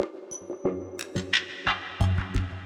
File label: Sweep Surveillance Kit - 90 bpm, drums; MIDI Clips; Ableton; Factory Packs; Mood Reel